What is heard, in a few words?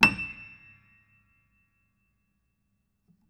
Note High, Piano; Piano Miscellaneous